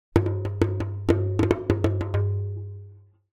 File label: Drums Short African Dance; Hollywood Edge; sound designer's toolkit v2